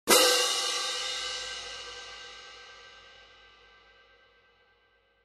16in Classic Orchestral Selection Medium Heavy Pair, cymbal sound